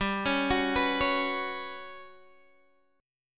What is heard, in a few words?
G Major 7th Flat 5th, Guitar Open G